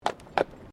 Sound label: Tram Horse Drawn Horse Step Pavement